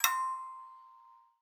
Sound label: Cable Connect Unique; LG Wing 5G Verizon